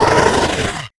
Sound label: kranagull scream; kraak